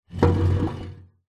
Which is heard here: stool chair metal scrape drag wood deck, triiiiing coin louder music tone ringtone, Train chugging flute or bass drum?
stool chair metal scrape drag wood deck